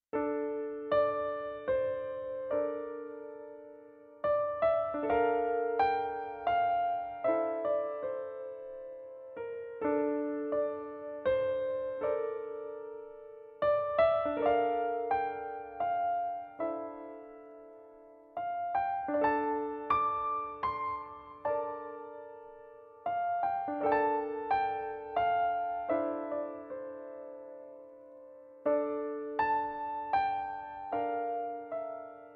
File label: Piano Version